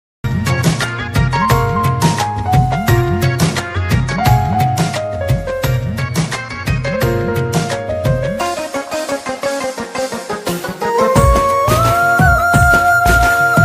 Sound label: christian ringtone flute music christian bgm telugu